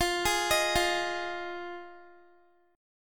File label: F Minor 7th, down Guitar; Fm7; arpeggiated; 4F,4A,5C,5G,5C,6E